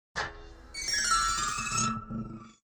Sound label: Sparkle